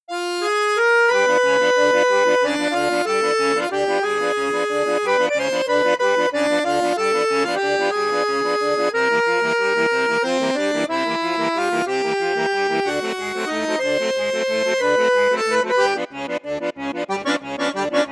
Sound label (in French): registre basson flute juste piccolo, accordeon; cavagnolo professionnel 12